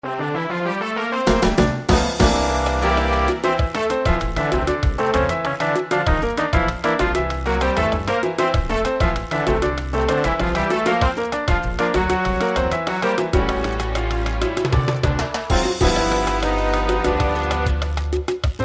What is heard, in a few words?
midi